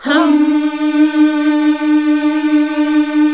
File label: HMM D#0; Sound & Vision - Gigapack II